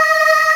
Fanfare; Thomas Fanfare; SNES; Romancing SaGa 3

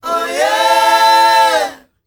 O YEAH, S. AFRICAN, World Voices; ILIO; Vocal Planet

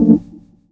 Chords Tones; Equipped Music; Loungin House

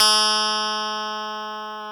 GTR STRAT, Clean E.Gtr, Brite Strat; Roland LCDP02 Guitar and Bass

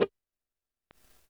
percussion, Roland TD-20

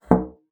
BASSOON; Xsample VOL-6

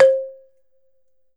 BALAFON; E-MU Producer Series Vol. 7 – Old World Instruments

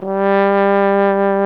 TENORHORN G1; Best Service; Alpine Volksmusik

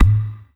DEEP EKO WD R, DRM HITS; Spectrasonics; Liquid Grooves; WAVDRM HITS1

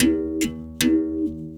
Tar, World Percussion

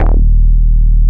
HOLLOW BASS; Masterbits; Dance Trax

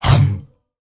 hum hum, S. African world voices

